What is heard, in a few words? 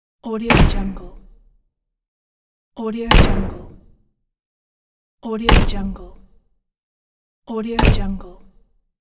door slam